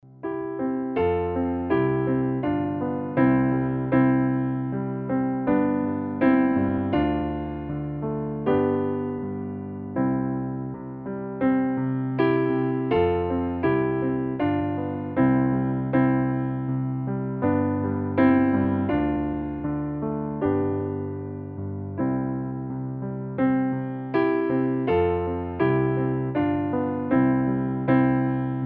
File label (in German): Playback C-Dur